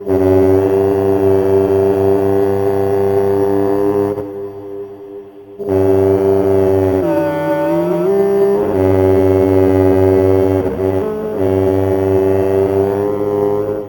EVP; Sonic Foundry (Sony Creative Software); Loop Noir

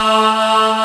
AHHH, Vox & Voice

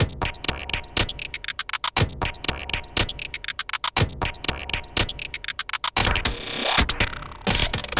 Beat, Modular Electronica; Sonic Foundry (Sony Creative Software); TSS Autopilot

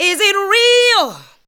ADLIBS 90; Ueberschall; Jam Box

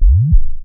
Zap C2; Club Techno

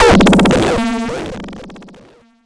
Cwejman S1 - Mod Mod

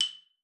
F#6, xylophone; Xsample VOL-4

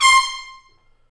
BRS TP STA1D, Stabs; Roland L-CD702

